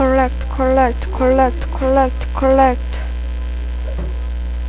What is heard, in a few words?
correct